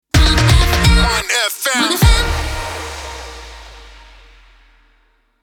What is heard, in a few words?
Jingle Sting